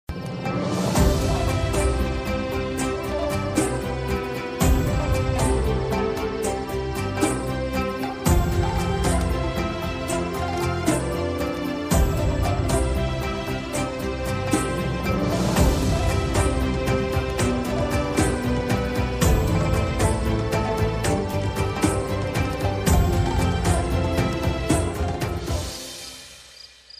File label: bgm ringtone; sang e mah